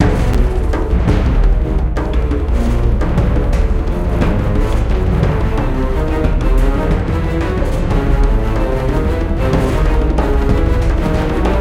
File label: Action Sting; Jurassic Park The Game